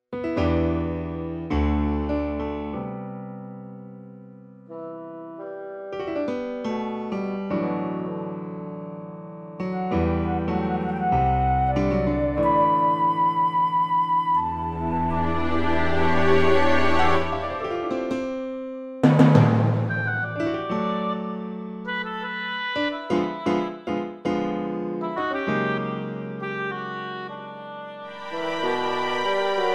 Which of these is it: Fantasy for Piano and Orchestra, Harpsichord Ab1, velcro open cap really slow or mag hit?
Fantasy for Piano and Orchestra